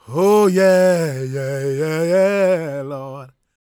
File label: GOSPEL MALE; Spectrasonics Vocal Planet